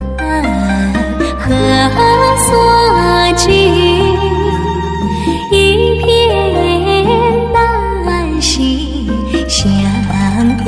chinese love ringtone

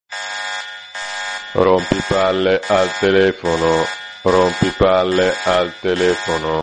rompi alert

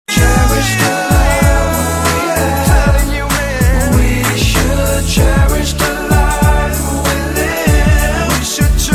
english

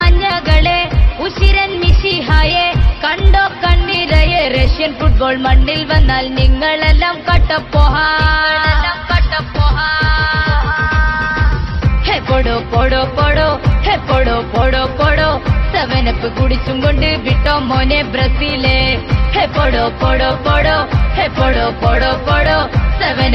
malayalam ringtone; argentina

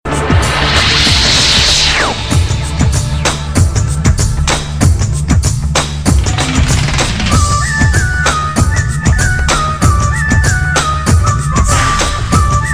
instrumental

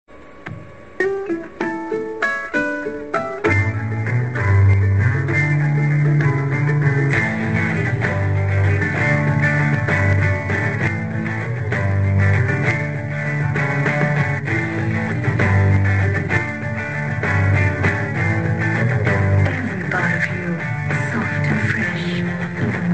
guitar; liril

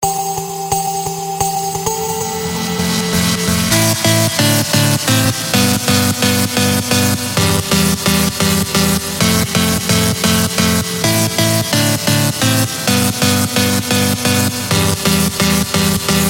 best trance sound; english

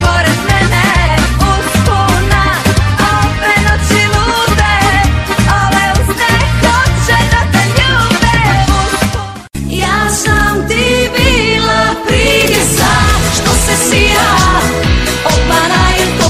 croatia mix ringtone

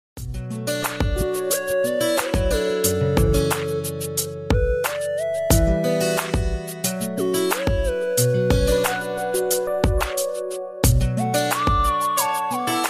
classic xiaomi ringtone; joi

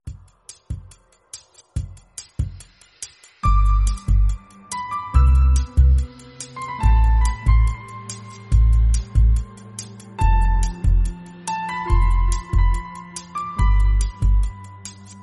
piano

